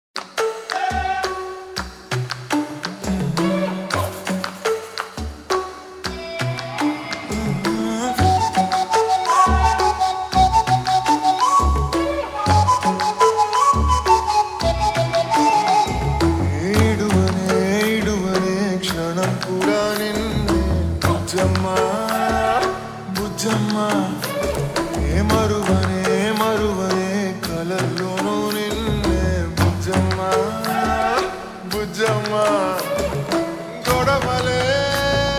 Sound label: Ringtone Slowed Reverb, Telugu; Ori Devuda